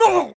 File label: voice male hurt pain; voice male b; Infernal Radiation; PC, Switch, Linux, macOS